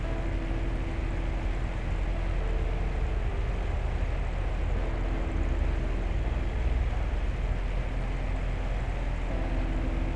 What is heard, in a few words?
J DOOR; THEATRON